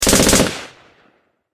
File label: Swat gun; friday the 13th killer puzzle